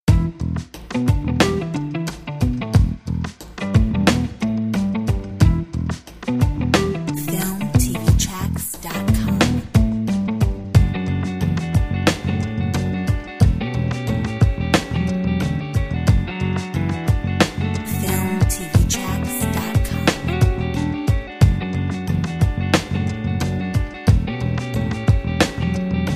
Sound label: Funk station